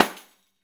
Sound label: Pandiero, Tambourines